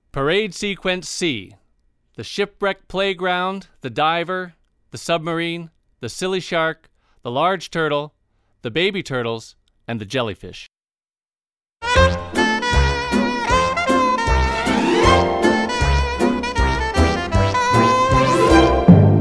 Music; THEME-PARK; Ocean-Kingdom; PARADE MUSIC

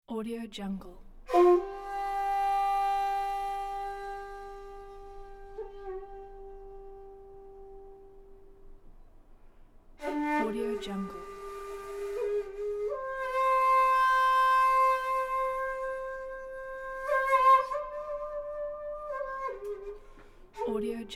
Shakuhachi Meditation; PrismicStudios

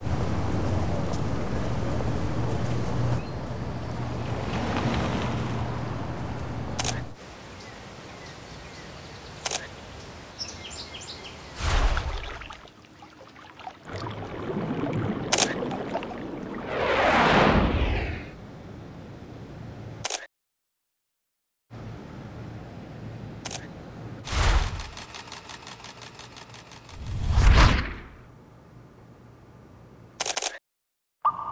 sfx; OPPO; Flower; 长版本; 分轨; commercial

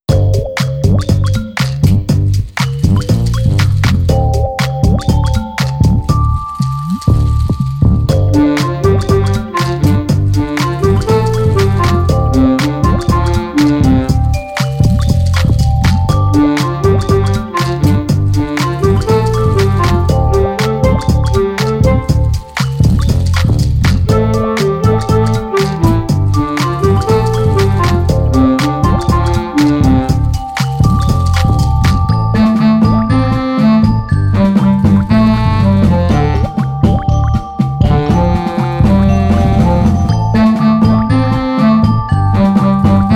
WATER, MUSIC only

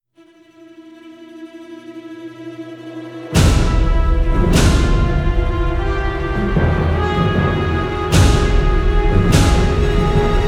ACTION ORCHESTRAL - BIRDS ATTACK

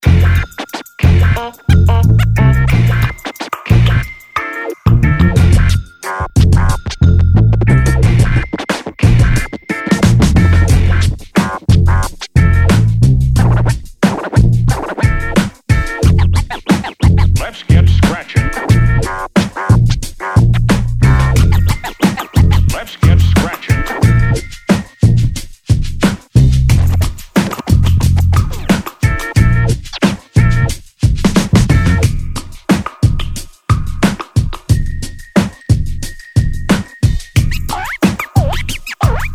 stereo MUSIC only

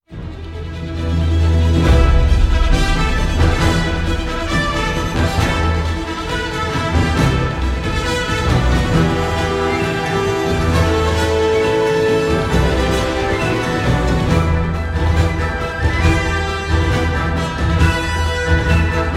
Action adventure; Kids Boat

